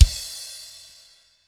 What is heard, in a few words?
BREAK; Best Service; Hyper Dance; CONSTRUCT